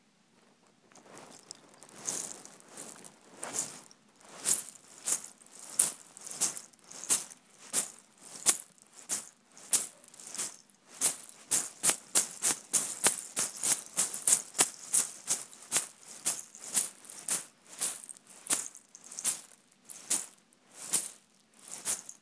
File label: Cherry seed steps